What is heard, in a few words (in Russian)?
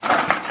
Выбрасывание мусра